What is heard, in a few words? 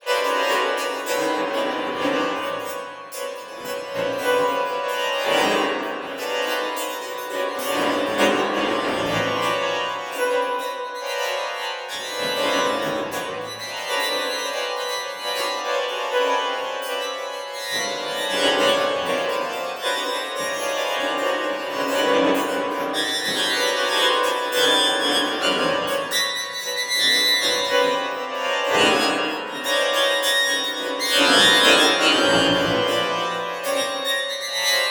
Strings; Xenakis